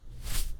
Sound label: Friction Between Clothes